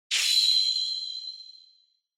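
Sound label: Onoma Syakiin Dry, シャキーン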